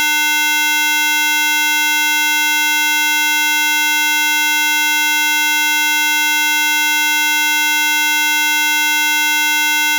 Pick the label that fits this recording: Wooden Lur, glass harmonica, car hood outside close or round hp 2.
round hp 2